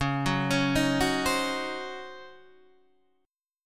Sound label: C sharp Major 7th Suspended 2nd Suspended 4th C sharp, Guitar Open D